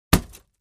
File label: Axe Paneling 2 Side Du, axe hits